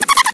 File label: scared, antlion grub; hl2